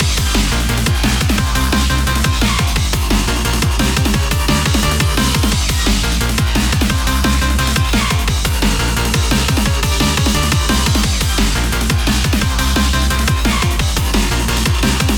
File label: BGM Neon; Pac-Man Championship Edition 2 Plus